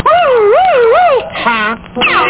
woohonk

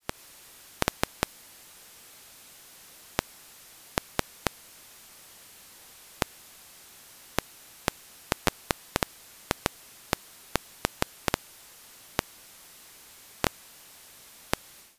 muon clicks

